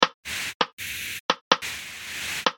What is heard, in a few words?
Choke; Rei Yumesaki